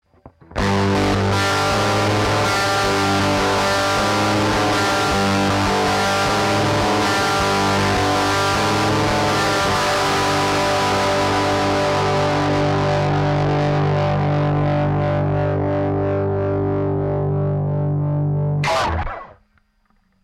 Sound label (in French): disto arpege; arpège son disto sans reverb